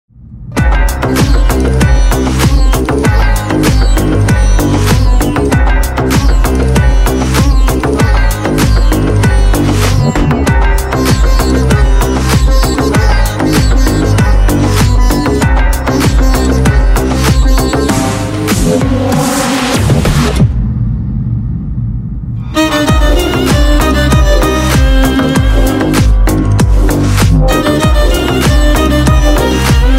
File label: bgm sound; rolex